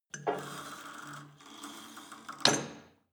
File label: cable machine old pull and drop sequence hard; sport freizeit